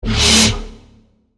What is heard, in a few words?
Wobble; Delta Demon; Routed Sound; Vital Expansion - Chaos Bringer Vol.1